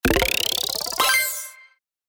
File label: faucet; dailyreward